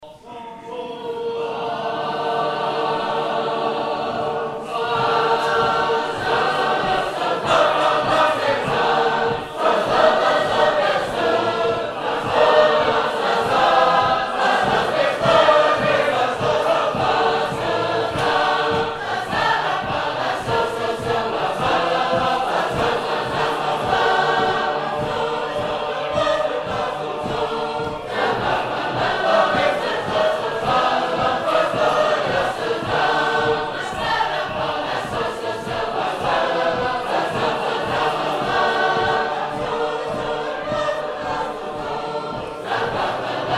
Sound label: Ocean, sacred harp